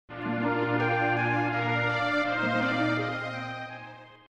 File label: map music; e.v.o. search for eden